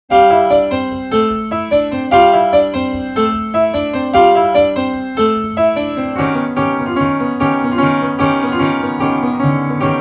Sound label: Schooldays piano, studio